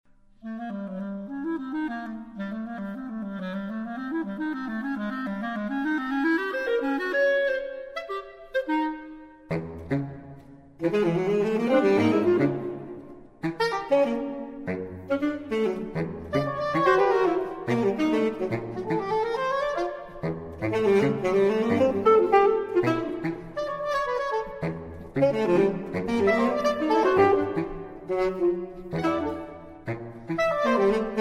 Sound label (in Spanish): Bop Cl Cuarteto Saxofones; MusicadeCamara; Cl En Sib y Cuarteto Saxofones